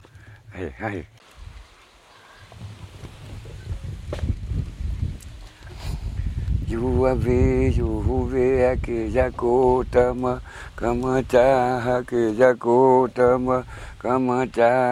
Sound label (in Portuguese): timbiras